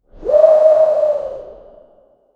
soft whoosh in the air

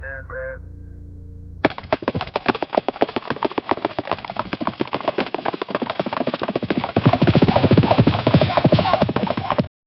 cowboys on horses riding, western

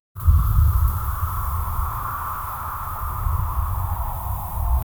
sand blowing through the, desert

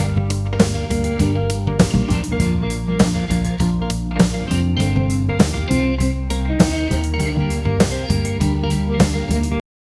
lo-fi rock instrumental

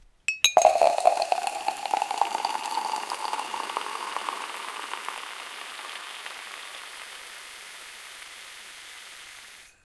pouring champagne in to a

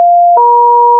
create a short sound that, call end

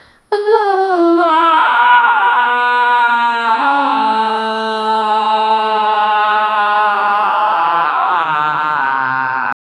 vocal laaaa laaaa la la; phonk

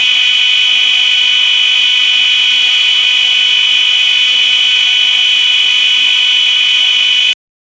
a car alarm going off in the middle of the night

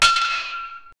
a single massive 100 kg; discrete

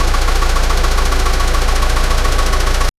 the car engine cranking

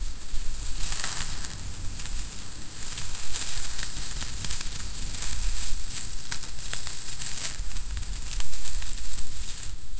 imagine a 3d scene where, garden

